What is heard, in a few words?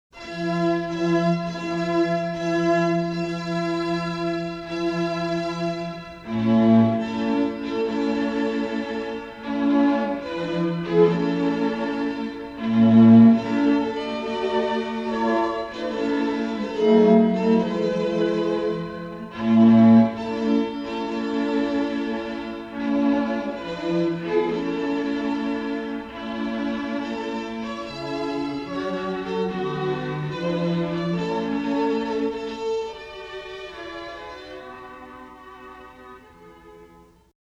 Strings Wagner Bridal Chorus; Caprice Strings